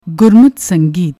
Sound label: gurmat sangeet, singing style; Sri Guru Granth Sahib